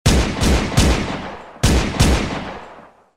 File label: gun shots